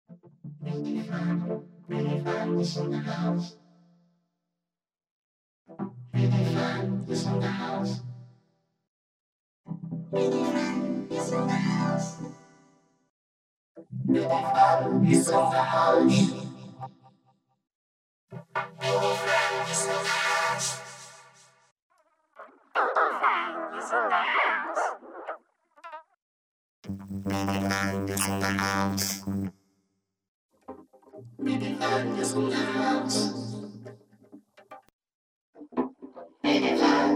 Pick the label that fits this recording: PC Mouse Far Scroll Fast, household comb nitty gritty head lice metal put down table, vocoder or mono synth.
vocoder